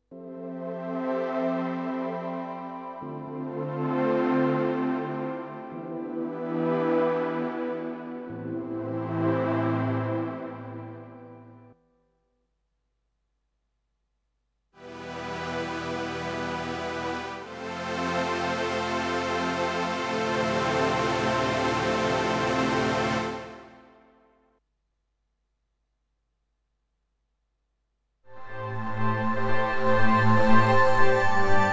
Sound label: Kurzweil Forte Pads